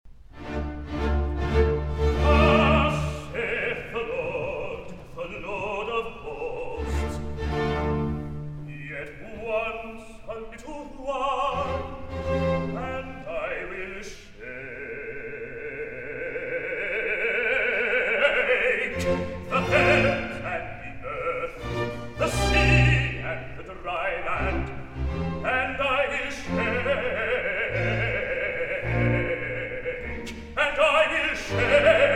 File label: Recitative; Thus Saith The Lord Of Hosts